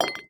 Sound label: bolt glass, material; STALKER; IWP